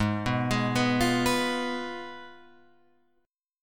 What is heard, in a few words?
Ab Minor 6th, Ab, Guitar, Open F; Abm6; arpeggiated; 8,6,8,6,8,6